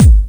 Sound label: normal hit whistle